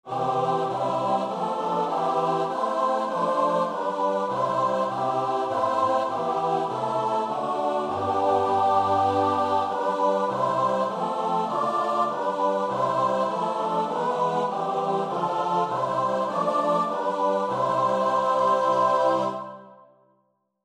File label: o god our help CHOIR